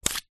household knife remove from plastic protective cover sheath